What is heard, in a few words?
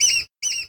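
Environment Fauna Birdie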